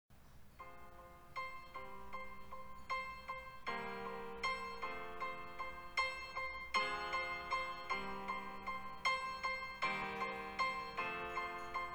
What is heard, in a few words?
sad music